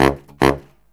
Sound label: Killer Brass Riffs